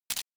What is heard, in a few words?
camera click short; Samsung; Galaxy Player YP-GB1; ui